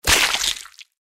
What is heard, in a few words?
explosion; Fruity Burst 2